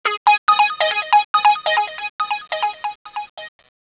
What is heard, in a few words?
test ring; Ringtones